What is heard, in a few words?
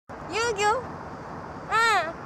cute whine